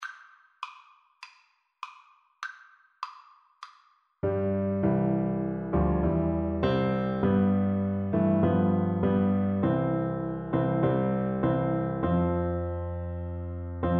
auld lang syne BN, Bassoon

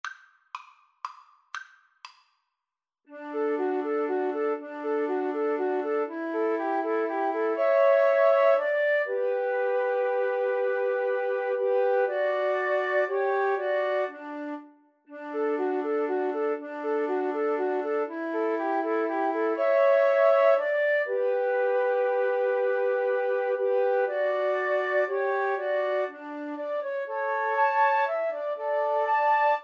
haydn minuet, Flute Trio

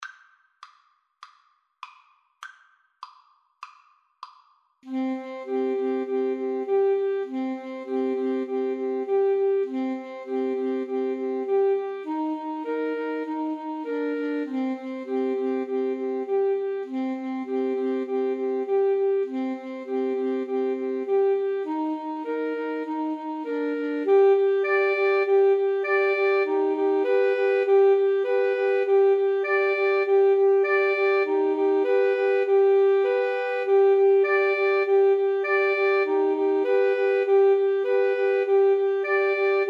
grieg mountain king, Alto Sax Trio

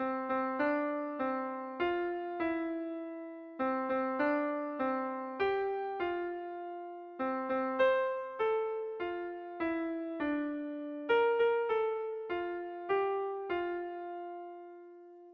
easy, piano

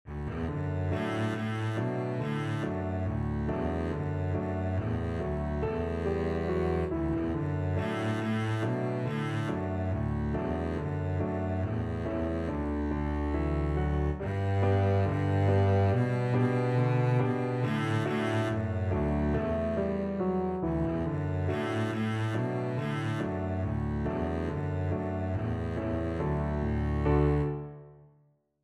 alabama, double bass

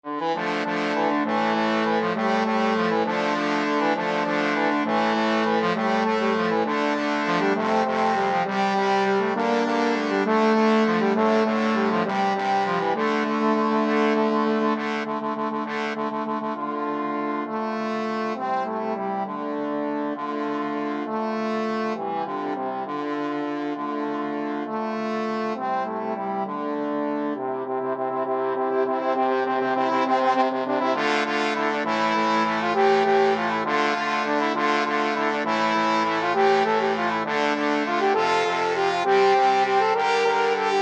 pirates, trombone quartet

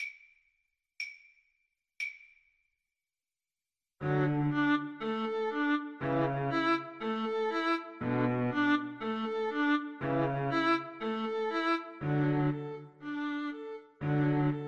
String Quartet

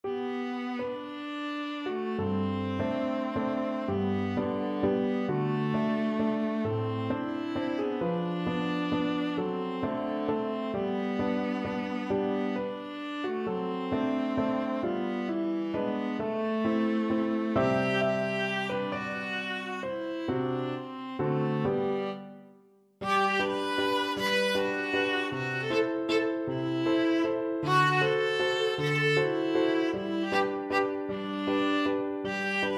viola

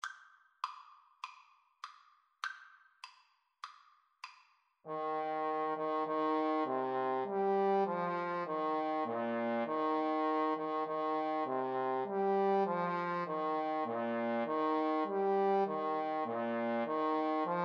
Trombone Duet

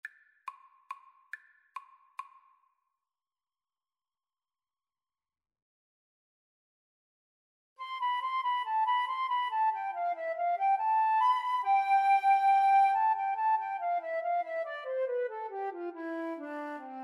Flute duet

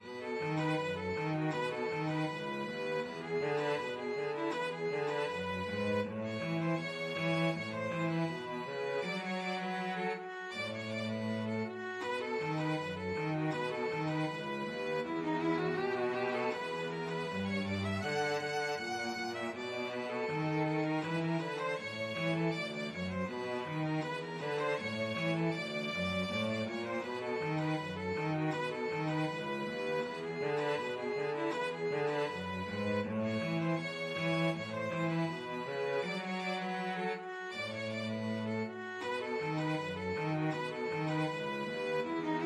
violin cello duet

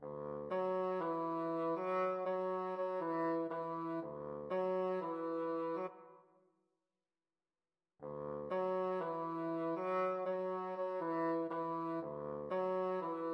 black pepper samba, bassoon

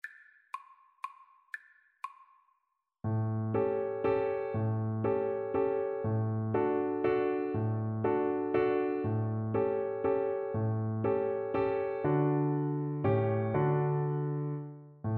german dance, Cello; schubert

